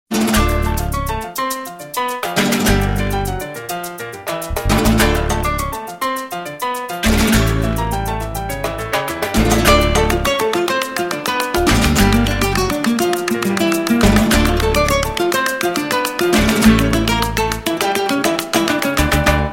instrumental guitar; Electronica

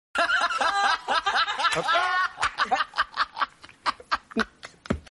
laugh

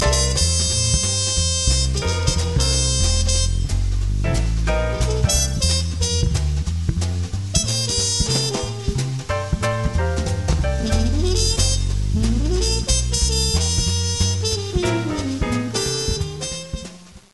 old jazz

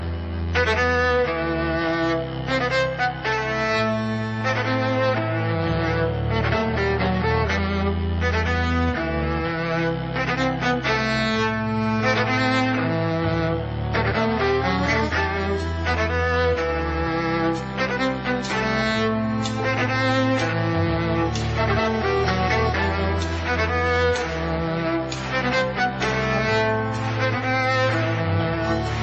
violin cover; Electronica

